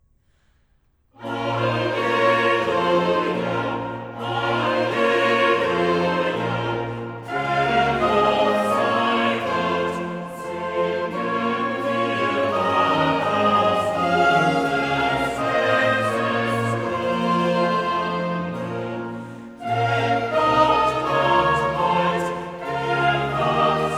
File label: Bach Cantata 110 BWV 110, choir only